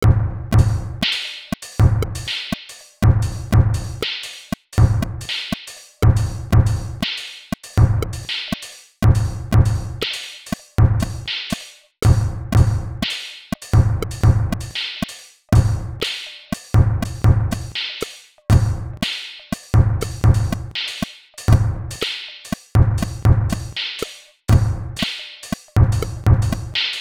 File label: sine wave